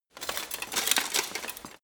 walk single

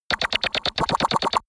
toy action figure

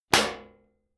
club hit sheet clank hard

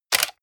camera

